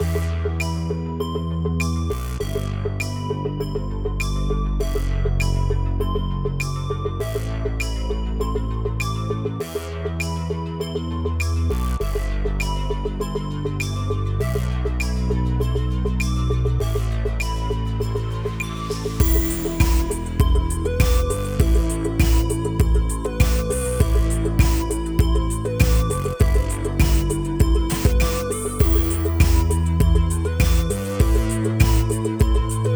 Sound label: music; scavengers